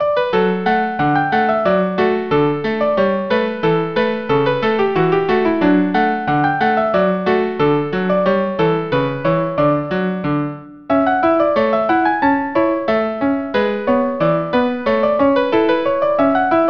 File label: violin w viola; richmond cotillion